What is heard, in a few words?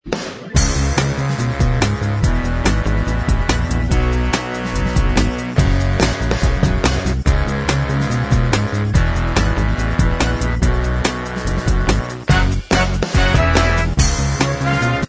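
instrumental, backing tracks; high school musical 3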